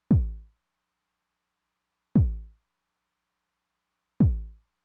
kick drum w accent, Roland TR-909; Roland TR-909 Ableton Project

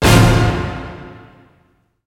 ORCH HIT; Kid Nepro - Elite

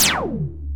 PRC E SWEEP; Roland L-CDX-01

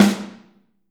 SN, Drums Percussion; CMB Kit 1

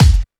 Bd Driver, Kick Drums; Zero-G; Soundclash

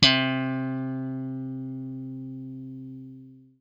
BLUE POP, Guitar & Bass

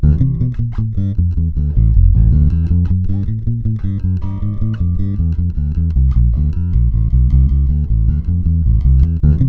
BLUES III, 156 BPM, Bass; Sampleheads; Ultra Freakin' Bass

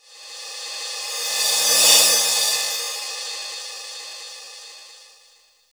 Rev-Cym; Roland R-70

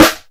Snare, Roland JX-305

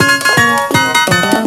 Elevator Music; Jurassic Park

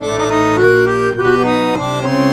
Balkanish Traditions; USB Soundscan vol.63; AKAI

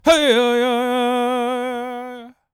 World Voices, CHOCTAW

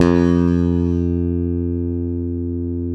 SPEC SUSTAIN, Bass

